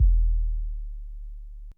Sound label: bass hit, Dmin; Big Fish Audio; Hardcore Breakbeat Action